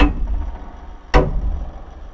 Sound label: tick